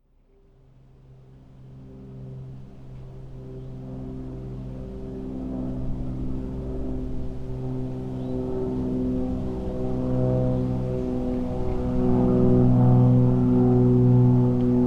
airplane prop fly overhead high slight wind trees distant birds; transport; zapsplat